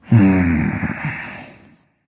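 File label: nonverbal content; smite; voicepack; ullr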